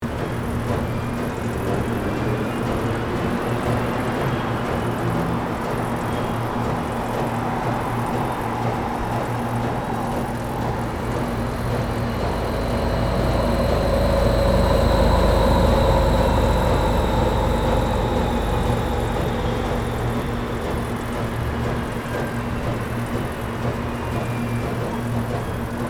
Construction site cargo and rocket pass by

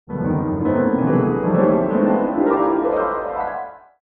Old vintage cartoon danger

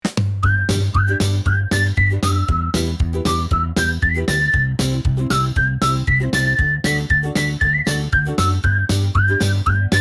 Upbeat positive good morning flow

